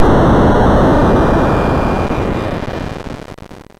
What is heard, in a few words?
dest; cosmicg